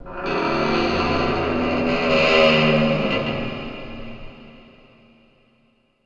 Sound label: Rocking Metal; Myst III Exile